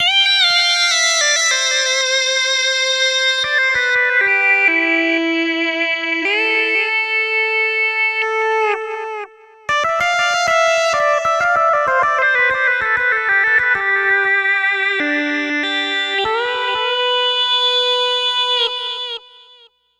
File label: 80s Lead; Dr. Fink's Funk Factory